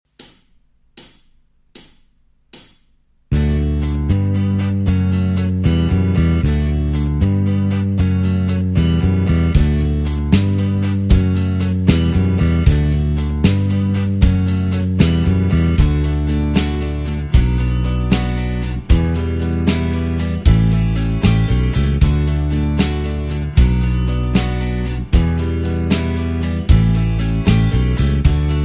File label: No Lead Guitar